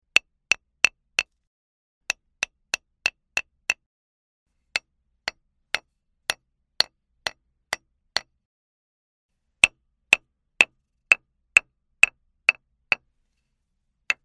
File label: hammer